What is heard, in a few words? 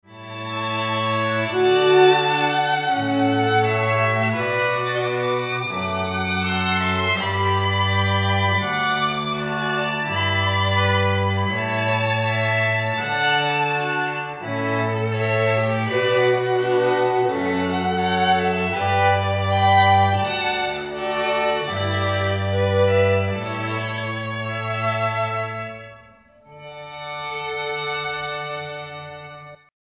Pachelbel Canon Live Clip, String Quartet